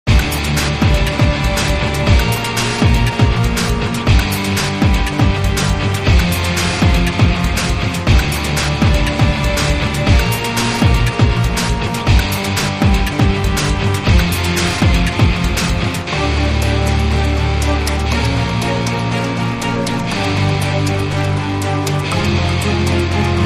Bomb Alert

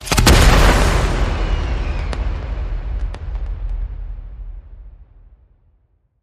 Firework Hit; New Years

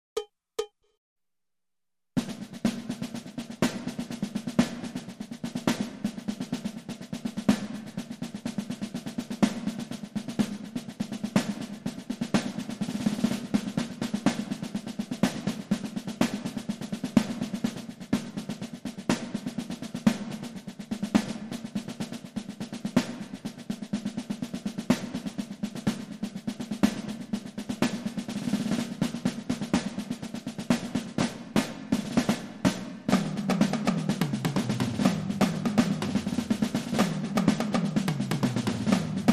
Marching Band Loop

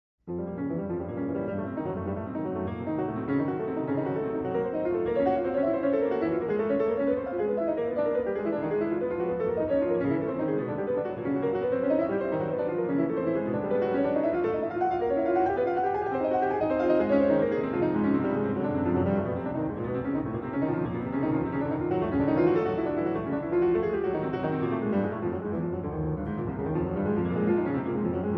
Piano Sonata in B flat minor; Op 35

